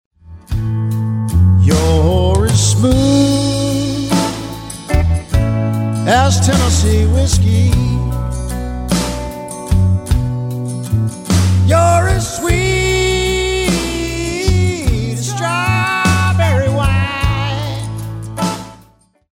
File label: sings